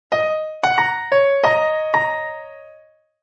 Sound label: Jazz piano phrase loop in D# minor at 180 bpm.; Jazz Piano Phrase End; Keyboard Loops